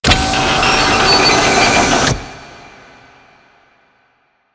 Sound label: Platform Rotate On